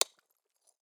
ice hit; teardown; ps5 windows xbox series xs

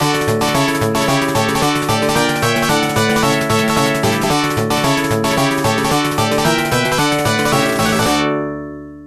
Stage Clear - Refueling; 1943 The Battle of Midway

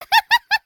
Caramel All Sound; Jolly Battle; Android

